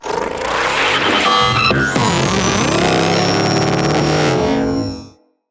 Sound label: Jackal Stomp Scream, Machines